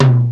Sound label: 505 TOM, TR-505; Hollywood Edge; The Big Bad Beatmachine